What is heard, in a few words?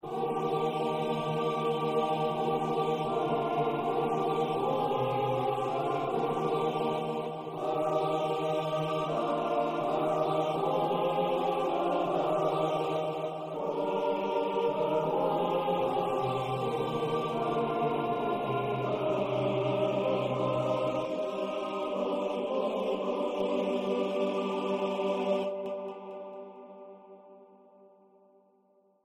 A Cappella Kyrie, Choir; William Byrd; Masses; Magnus Choir